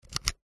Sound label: technology micro usb cable plug in; zapsplat